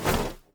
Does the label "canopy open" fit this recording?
Yes